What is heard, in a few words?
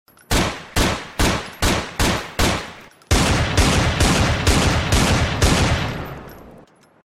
real weapons